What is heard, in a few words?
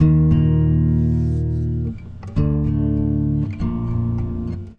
Guitar Only; Sonic Foundry (Sony Creative Software); Friendly Fire